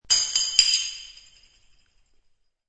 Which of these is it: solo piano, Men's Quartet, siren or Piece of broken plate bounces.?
Piece of broken plate bounces.